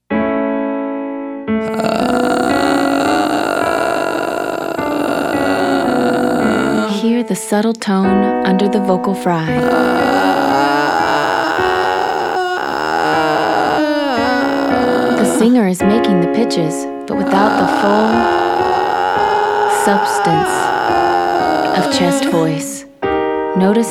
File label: Exercise vocal Fry males; Singing